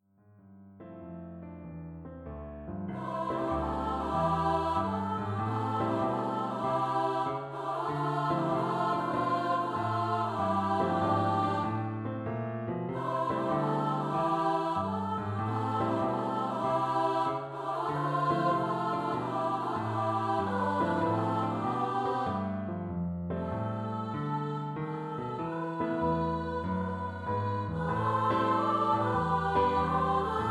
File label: Vocal duet for 2 treble voices